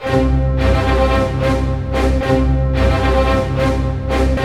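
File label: 112 war, orchestra; East West; Scoring Tools; War Status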